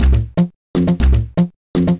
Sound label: Vector Wave Sequences; Ableton; Retro Synths; Rubber